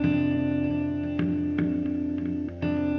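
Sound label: electric guitar, ethno, 160; eJay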